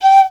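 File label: piccolo staccato G5; Flutes; DirectWave; Varazdin Orchestral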